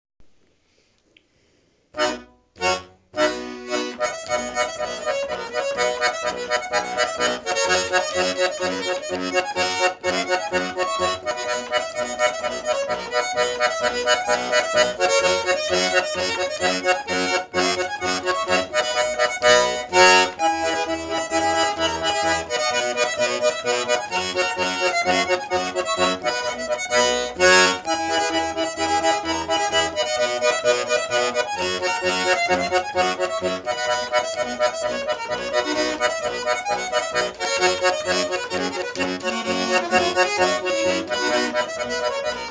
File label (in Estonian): Mäeotsa polka